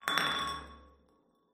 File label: glass knockover; ambient; amb oneshot